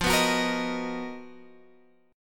B Major 7th F, chord; Bmaj7/F; strummed

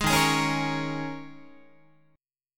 Eb 7th Suspended 4th Eb, Guitar; Eb7sus4; strummed

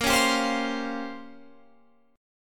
Bb Minor 6th Add 9th Bb down Guitar Open F; Bbm6add9 strummed

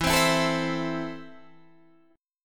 E Suspended 4th Sharp 5th, Guitar Standard; Esus4#5 strummed; 12,15,14,14,13,12

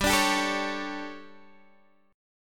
D Major 7th Suspended 4th, guitar chord

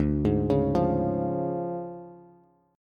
D Minor Major 7th Flat 5th D Bass; 10,11,11,10; DmM7b5 arpeggiated

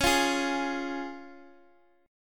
Db Major Db down Guitar DADGAD

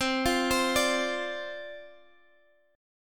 D-Minor 7th C, chord

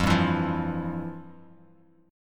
F Major 7th Suspended 2nd, Bass Standard; strummed; JGuitar

